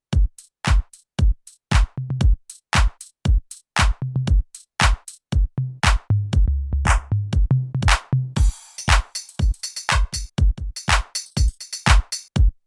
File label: Drum Kit